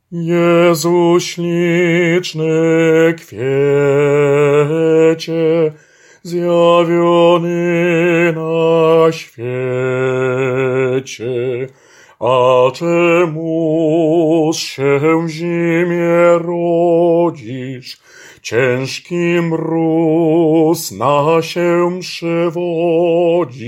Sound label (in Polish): Jezu sliczny ALT